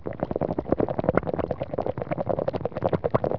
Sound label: toxic loop; cs2d; cityrp